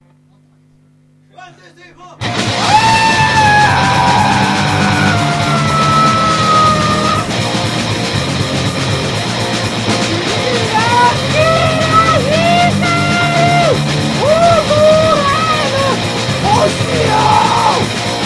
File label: Twinkle Twinkle Little Star